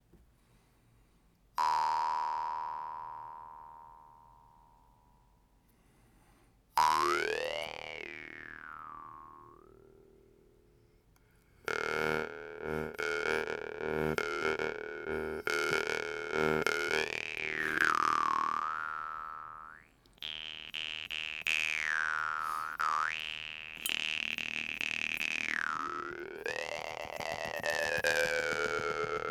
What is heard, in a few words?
storm